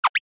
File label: Motion Pop